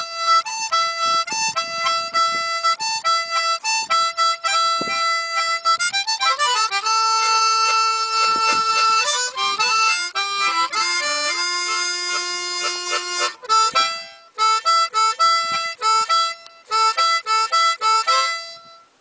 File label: Harmonica